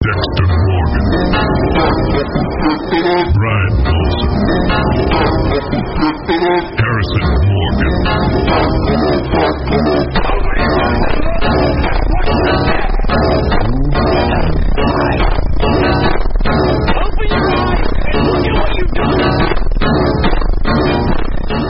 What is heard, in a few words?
phonk